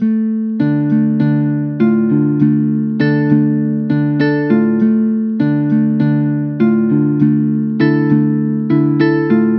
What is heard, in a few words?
Musical Loop 100 D Minor; Drift; Ghosthack